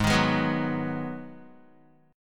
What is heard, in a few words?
Ab Minor 7th down Guitar; strummed; JGuitar